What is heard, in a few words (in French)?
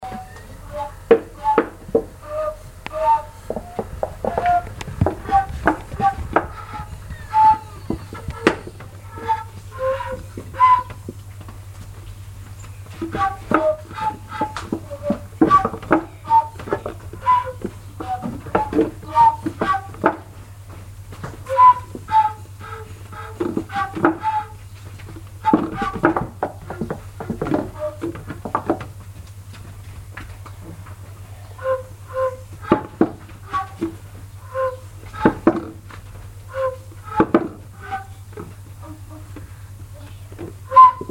Orgue de pan